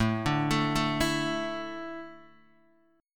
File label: A Suspended 4th, down, Guitar, DADGAD